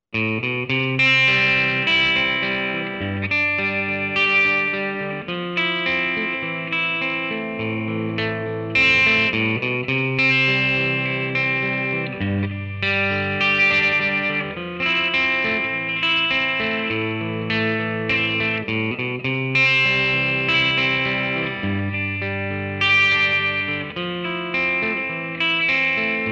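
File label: clean, guitar; Class A clean tone; jtm; GD101X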